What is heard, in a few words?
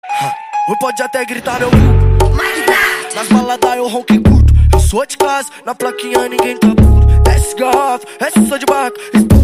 music